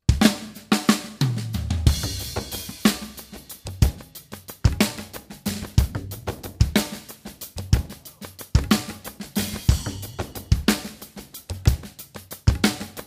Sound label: Grooving with Loops